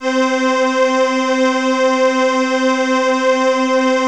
CRUMAR; Masterbits - Vintage Voltage; CRUMAR PERF

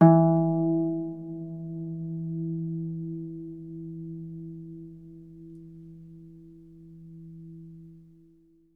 Harp E3; Miroslav Vitous - Percussion & Harp

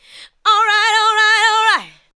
ADLIBS

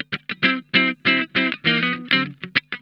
SLINKIN, Funk Guitar; Zero G - Funk Guitar

